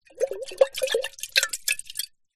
household teapot ceramic tea inside movement splosh around lid off